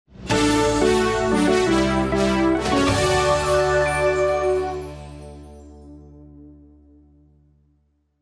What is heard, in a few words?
Arena Win Music